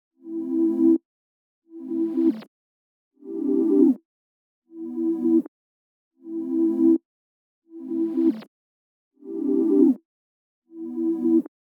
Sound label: 80 BPM C Maj Reverse Pad; Loop Stems; Cymatics; People Change